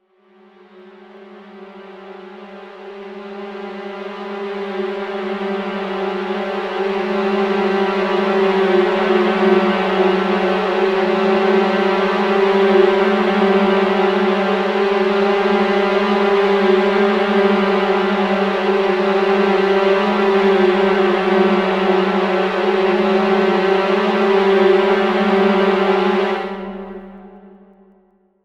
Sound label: mix Insects